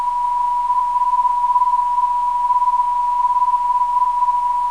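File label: 386kHz, non-directional beacon; PTB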